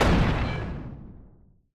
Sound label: shoot ripple; Mindustry